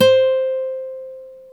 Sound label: GTR Nylon, Roland